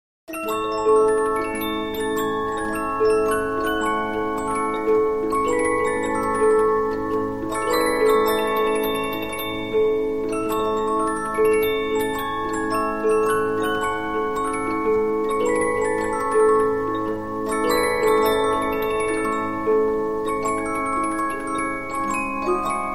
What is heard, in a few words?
Polyphon, 15inch disc musicbox